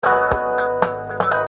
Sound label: G Sharp With Fourth